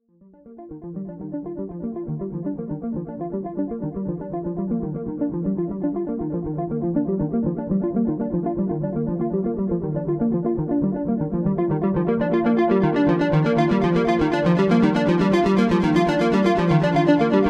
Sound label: Arpeggio Passage